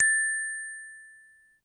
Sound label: get star; rabbit samurai 2